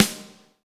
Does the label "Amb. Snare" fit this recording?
Yes